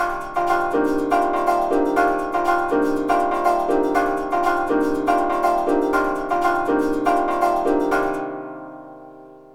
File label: Trance SYNTH SEQ, 120; SALSA; AMG; Global Trance Mission VOL-2